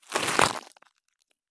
stop gravel; Escape from Tarkov

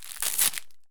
crackers open; Escape from Tarkov

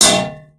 tank hit small interior

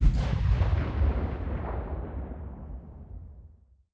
combat atmosphere; World of Tanks

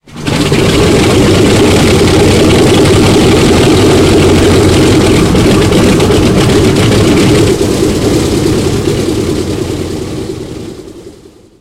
engine c46 start cockpit; War Thunder; engines

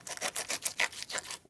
cut meat; Rust

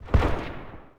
polycraft 1 shot echo

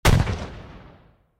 apache bullet hit layer

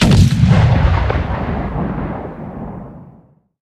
art cannon shot far

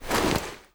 foley gear rattle

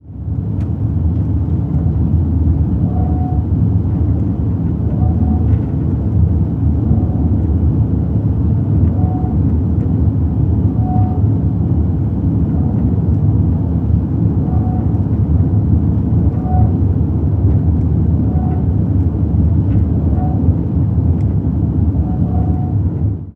ship engines; World Of Warships

